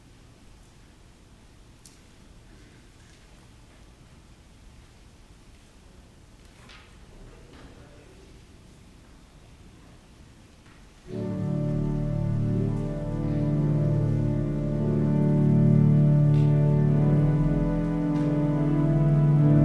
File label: Organ Music